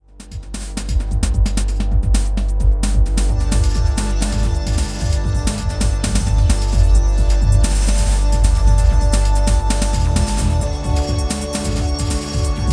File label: range beat